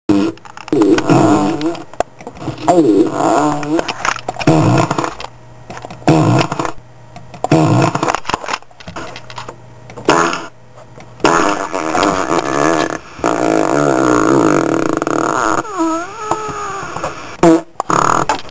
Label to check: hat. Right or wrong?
Wrong